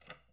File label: door bolt; angband